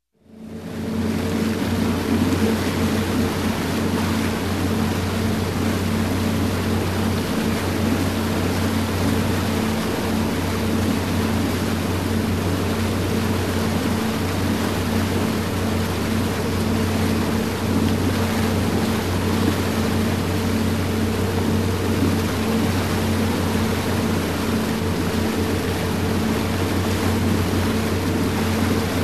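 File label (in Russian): motorboat moving, engine noise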